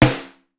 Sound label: Snare, Pitched Down; Clavia DDrum-AT